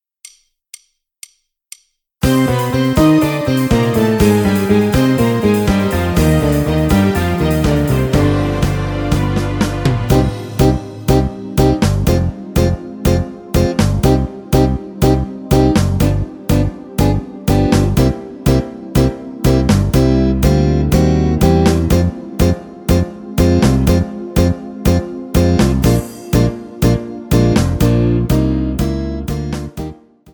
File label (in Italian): tango, base